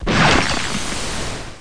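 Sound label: sizzle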